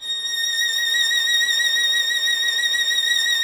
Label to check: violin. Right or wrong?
Right